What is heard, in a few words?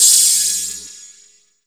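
127 drum 2 L, Fresh Disco House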